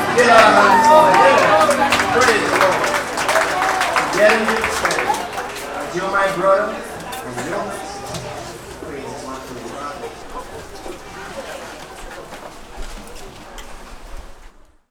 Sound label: church pray the lord; best service Blue Box